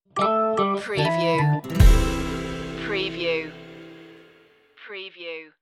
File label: reggae roots stinger